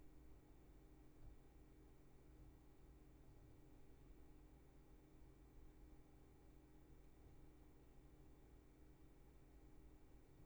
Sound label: Room tone